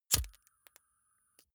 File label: lighter wheel switch on flame gas